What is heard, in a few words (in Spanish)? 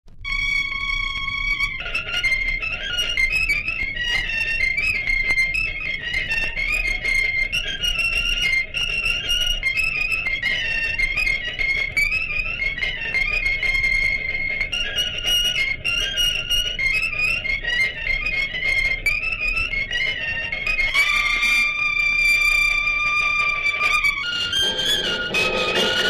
musica de los piteros; Villapresente